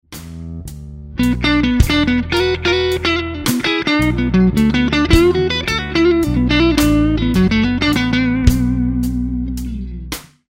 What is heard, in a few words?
Lick, guitar; Slow Minor Lick 8; Minor Blues licks